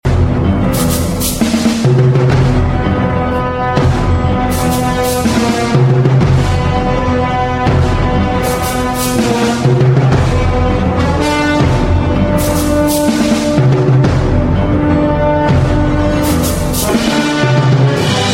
sound track; ringtones; predator

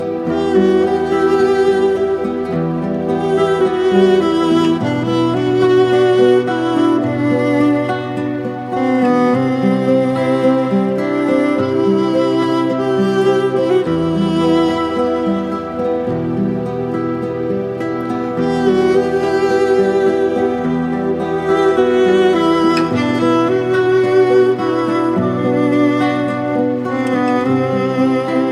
romantic

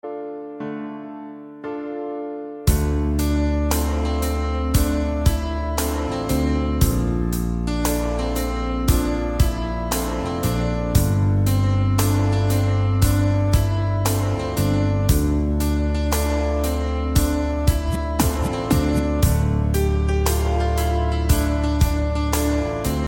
piano

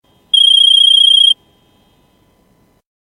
Call; GTA 5

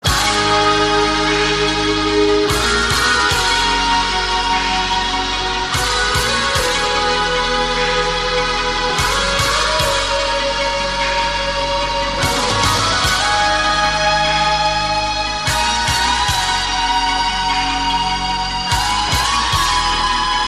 guitar intro

